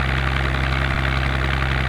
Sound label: jeep med